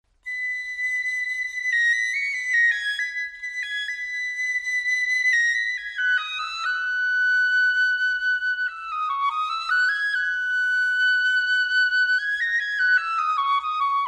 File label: piccolo sound sample